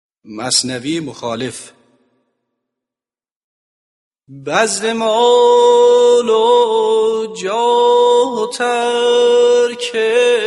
masnavi mokhalef

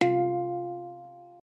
hang

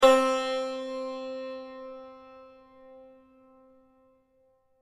santor do

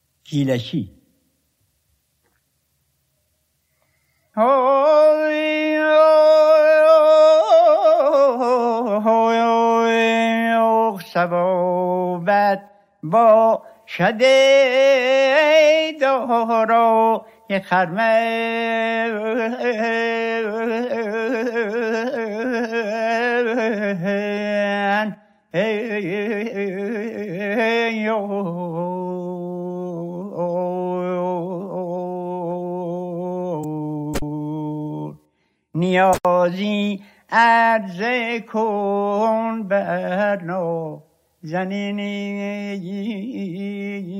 gilaki, dashti

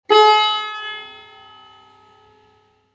tar la

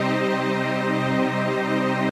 do minor; playback

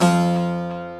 baglama; baghlama

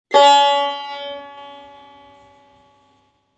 tar re

